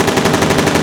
Attack; Pacificwar

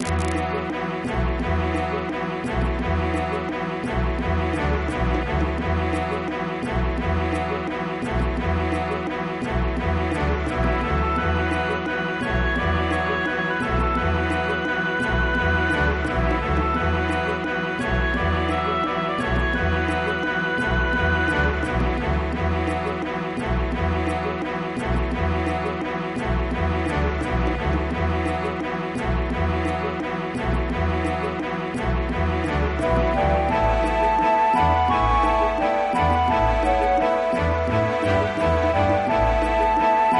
bgm

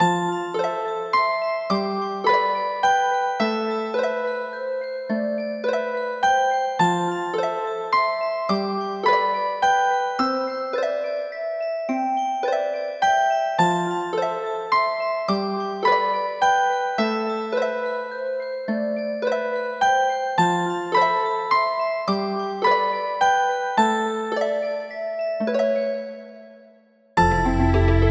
game over

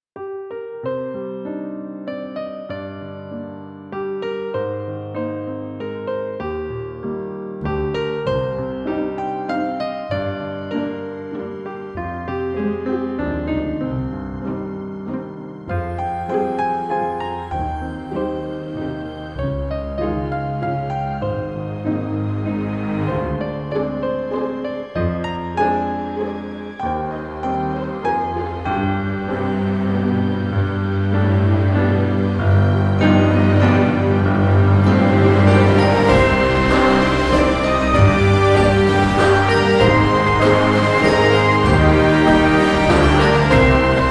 bgm

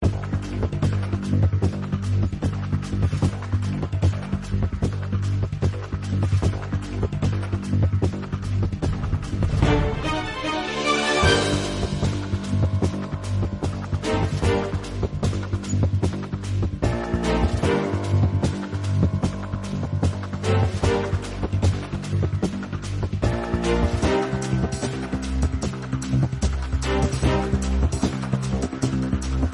music

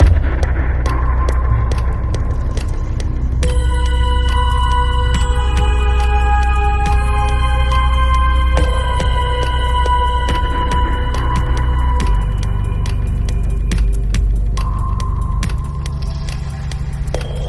Dungeon

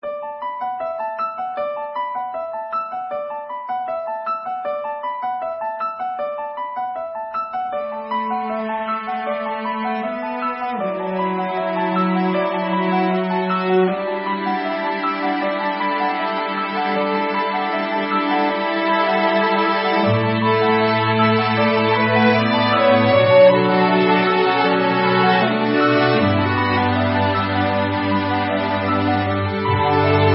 bgm

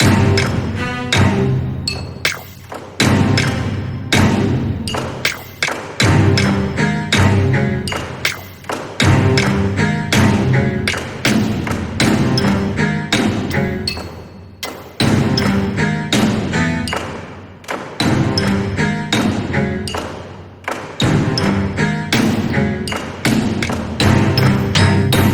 Bgm Fantasy

